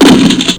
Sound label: Shotgun; Destruction! 2.0.1